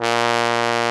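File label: brass, Solo T.Bone; Roland L-CD702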